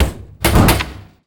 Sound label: vending machine soda drop; Call of Duty Modern Warfare